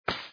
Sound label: UI fall; Brazil; football